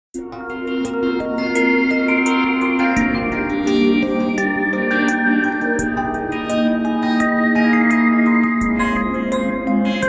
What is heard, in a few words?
Nokia Tones; Weave; Windows Phone apps